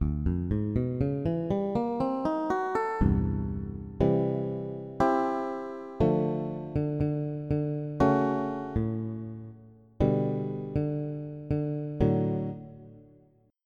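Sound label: Fingered Bass; EverQuest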